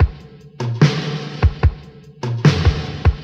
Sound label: almost funk, Drum loops Boombap